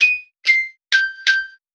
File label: keys bumpy, jazz loops